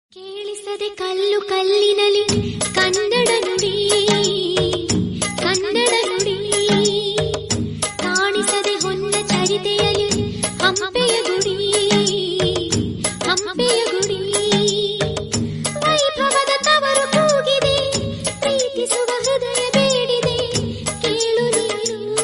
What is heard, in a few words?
kannada song